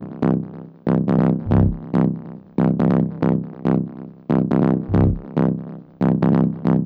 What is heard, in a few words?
Trance Bassloop 140bpm, Bass Arps